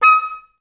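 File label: oboes staccato D#5, orchestra; OBS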